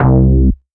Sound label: Bass one shot C, Basses; Electronic Point Blank